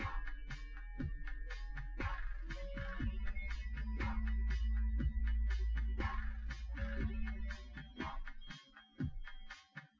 w drums synth conv 250ms 5cm sim; SiSEC08; SiSEC underdetermined

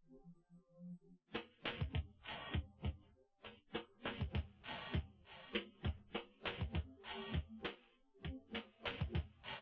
wdrums inst sim

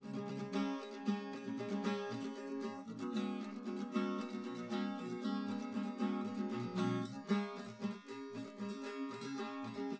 no drums inst sim; SASSEC07

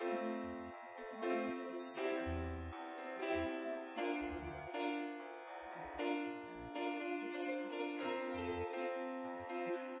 nodrums; SiSEC08; SiSEC underdetermined

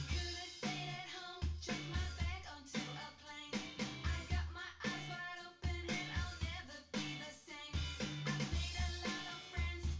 wdrums synthconv 250ms 1m mix

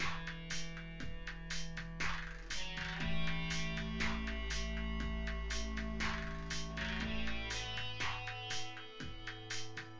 wdrums synthconv

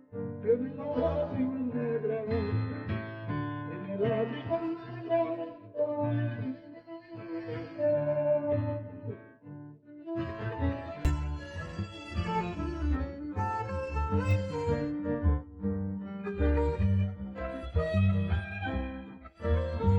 Piano; ICASSP11

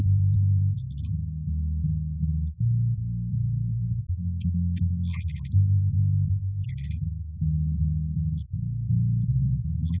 nodrums inst sim; SiSEC10; underdetermined

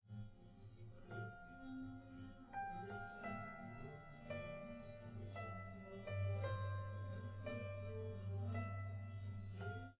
no drums, live rec; SiSEC11; underdetermined

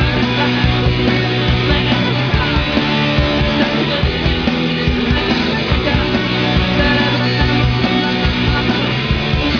music

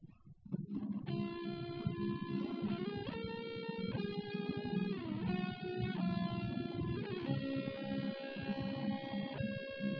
nodrums inst sim; SASSEC07

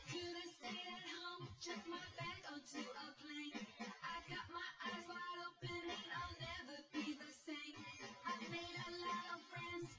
w drums synth conv 250ms 5cm sim; SiSEC08; SiSEC underdetermined

